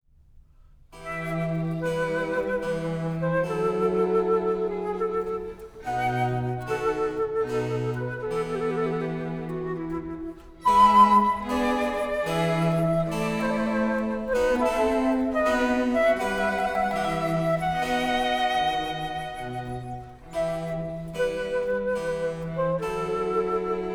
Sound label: Trio Sonata in G minor Adagio